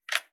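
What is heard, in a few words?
gear rattle weapon medium; viper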